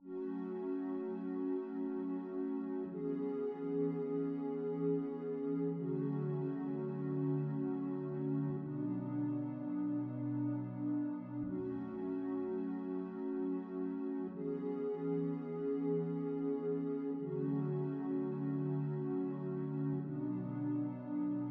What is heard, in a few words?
Pad, 168 BPM F# Min; Loop Stems; Cymatics; Infinity Melody Collection; Pretender